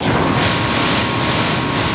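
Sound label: engine; BigMax nº 18 MAX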